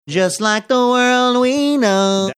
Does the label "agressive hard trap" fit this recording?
No